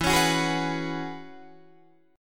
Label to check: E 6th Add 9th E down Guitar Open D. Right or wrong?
Right